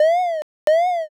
warning light

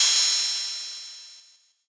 cymbal

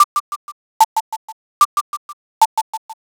ticktock

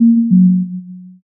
error

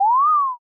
refresh

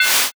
slash heavy

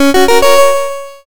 start

